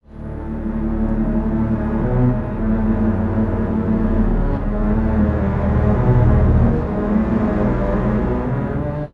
strings, pitchshift; serato pitch n time pro

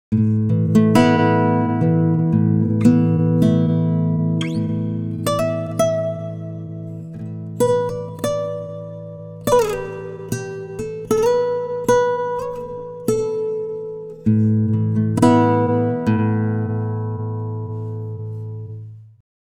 timestretch guitar; ikm sample tank ps ts

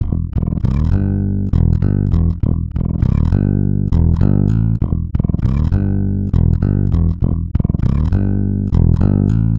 pitchshift bass; celemony melodyne

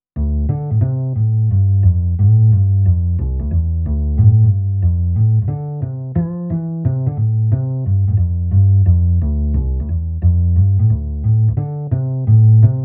abass sample, Clavia Nord Wave

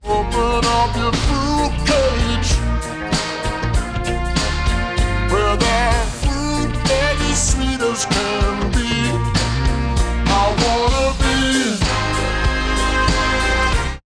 Digidesign Pro Tools X-Form pitch shift -5

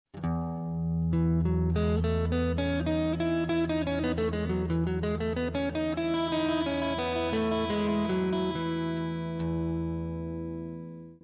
e major scale

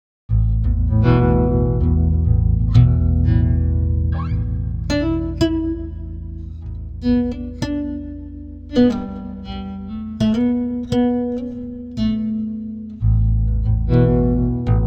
pitchshift guitar, Native Instruments Kontakt 12

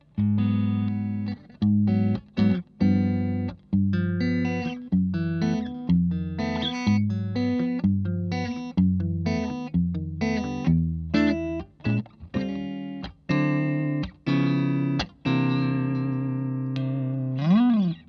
telecaster; fender new standard guitars

